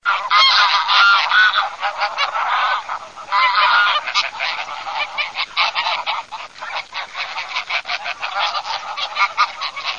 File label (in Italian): OCA DARIO, birdsound